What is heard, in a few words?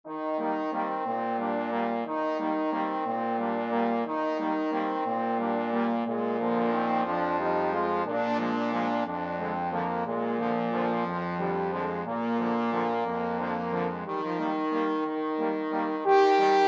cielito lindo, trombone quartet